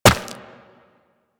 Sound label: Run Rock, Footsteps; Warframe; Operator